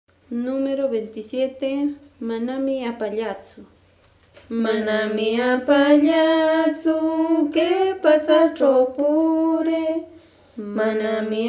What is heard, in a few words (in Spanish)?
cancion